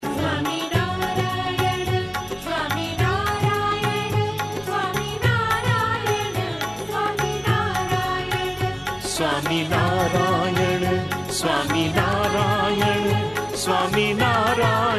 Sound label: SWAMINARAYAN DHUN